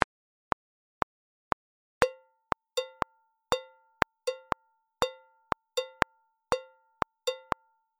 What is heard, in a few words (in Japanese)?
付点4分, delay